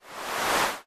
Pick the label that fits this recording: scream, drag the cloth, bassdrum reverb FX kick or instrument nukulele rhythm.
drag the cloth